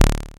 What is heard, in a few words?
dry perc, Quasimidi Rave-o-lution 309